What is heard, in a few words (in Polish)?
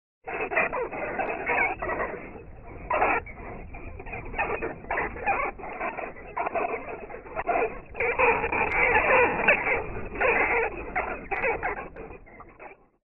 nietoperz; karty obrazkowe; przygotowania do zimy